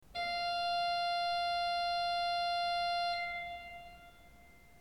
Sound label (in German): ton, orgel